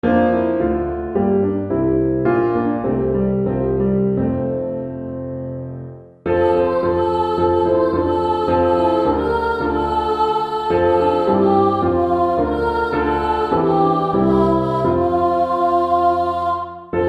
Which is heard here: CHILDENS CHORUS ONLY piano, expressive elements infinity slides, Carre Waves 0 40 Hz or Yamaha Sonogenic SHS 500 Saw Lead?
CHILDENS CHORUS ONLY piano